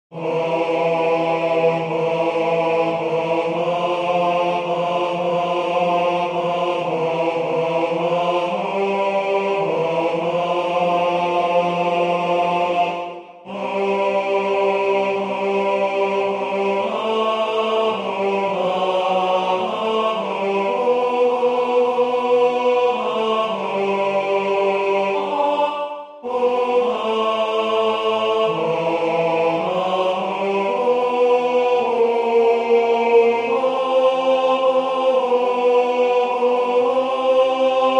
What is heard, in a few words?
tenor; Peace On Earth